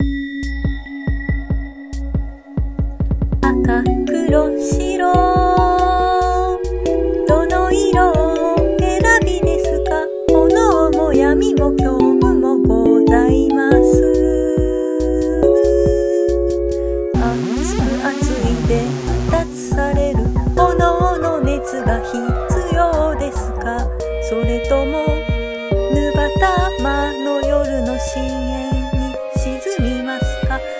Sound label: Song with vocals